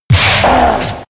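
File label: lesserking damage, monster; Senillinea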